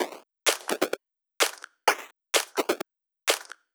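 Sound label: Drum Loop 128BPM Clap & Percussion; VEC5 Drumloops; VEC5 Multi Loops; Loop Elements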